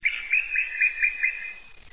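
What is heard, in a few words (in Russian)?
лес звонкий активный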